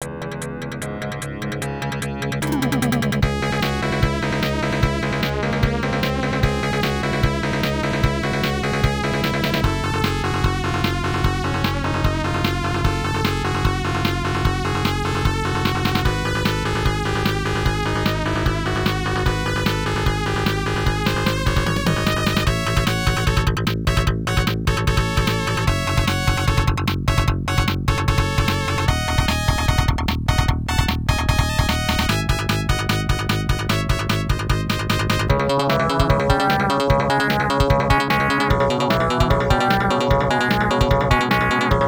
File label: Battle; Emerald Dragon; PC-8801; OPNA